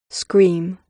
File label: scream